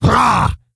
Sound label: Stonebark Attack; Elder Stonebark